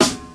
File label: old school loud snare drum b key; snare drum samples